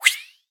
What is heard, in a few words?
Arrow Coming Into Screen